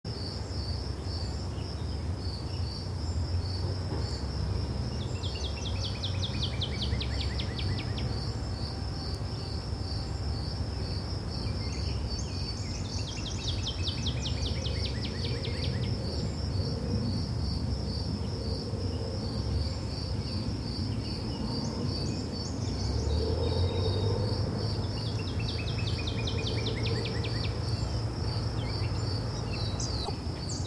Parks and Fairs; Blastwave FX

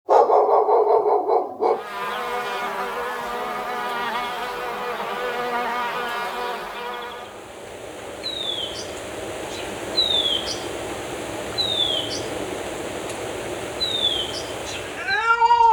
Animals SFX; Symphonic Production

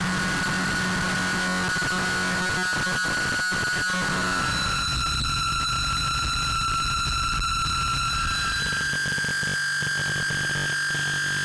Harsh Noise; Glitchedtones

